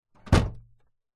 car boot trunk open unlock nissan patrol; vehicles

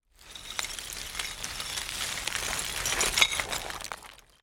foley small debris rubble movement

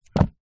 household book cub scouts record small set down; zapsplat

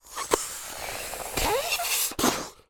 foley balloon blow up fail not enough breath

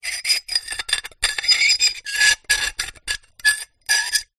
glass shards x2 scrape together; foley

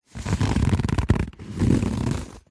cardboard envelope box handling rough fingers rub; zapsplat

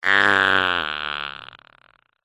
human fart dry forced

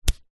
hand grab body skin arm leg etc

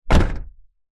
vehicles truck small door close medium

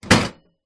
industrial tool box metal old medium down

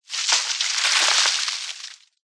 foley debris dirt dust fall drop collapse

